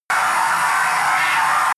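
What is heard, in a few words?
screech buzz reverb, science fiction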